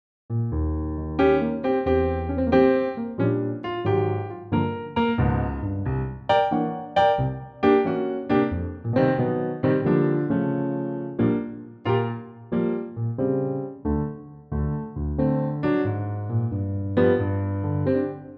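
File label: Bb Piano track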